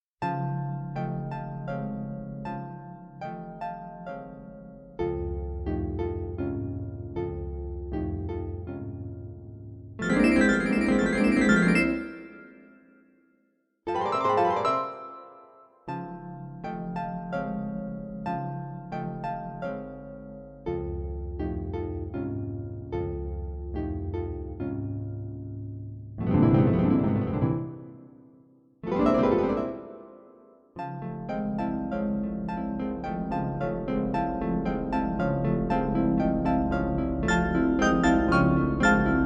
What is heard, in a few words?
Arpa